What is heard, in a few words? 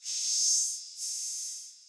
HATS N CYMBAL